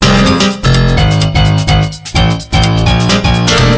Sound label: House Loop; Philips; Multimedia Studio